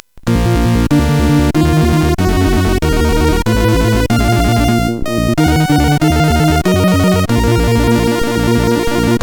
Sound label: JAZZZZ, CSG8580; hvsc